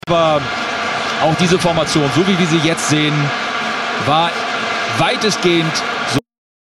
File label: Vuvuzela Filter